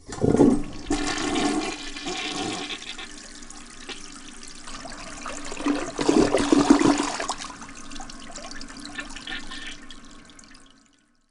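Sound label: W.C. FLUSH, TOILET; 1000fx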